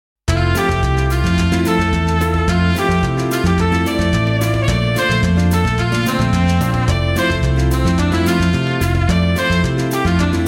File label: pop samba